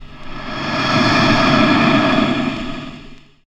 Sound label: Breath; Zero-G Jungle Warfare 1, 2 & 3; 90 s Sample CDs